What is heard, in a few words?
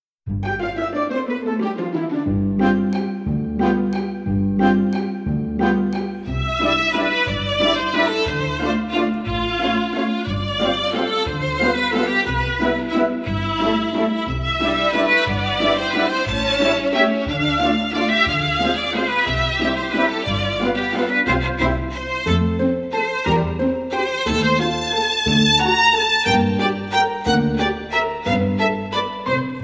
Classy Waltz; Classy Cranberry's